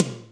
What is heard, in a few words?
Toms, Real